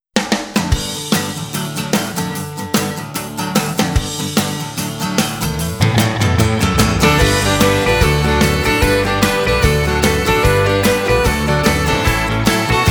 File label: 4-4 shuffle; rhythm; meter id